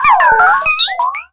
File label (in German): Ruf